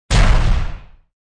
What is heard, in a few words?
Bomb; Angry Duck Cannon 1.5